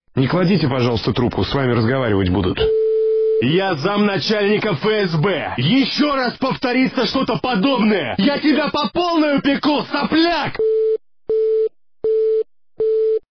obychnyi zvonok

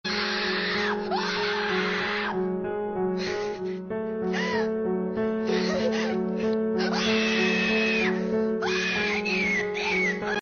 shkolnik oret

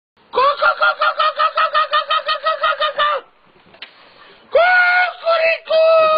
kukuryku

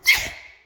khriplo

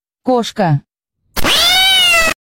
koshka meuu

